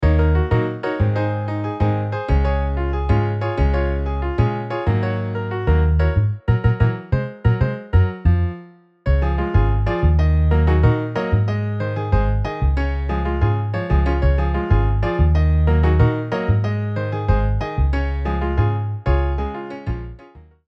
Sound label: Piano Bass MIDI